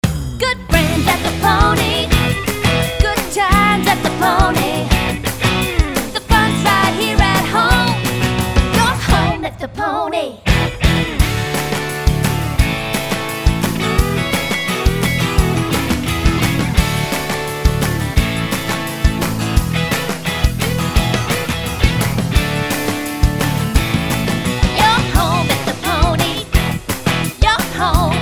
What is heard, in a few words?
Jingle; golden pony casino